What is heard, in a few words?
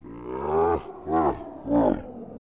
Dead Hand Laugh; OOT